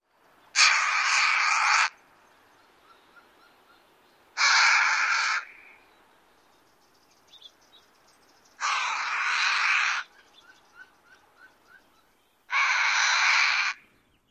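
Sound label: Harris's Hawk, call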